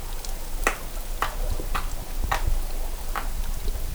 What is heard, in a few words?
Acipenser oxyrhynchus, sturgeon snap series; kennebec; augusta; soniferous